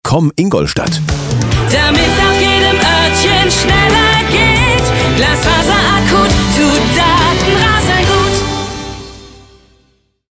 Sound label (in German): Soundlogo